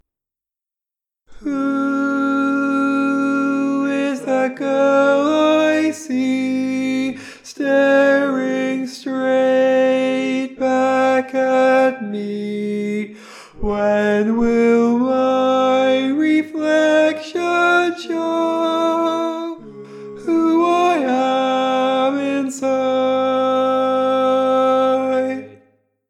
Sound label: Tenor, barbershop tags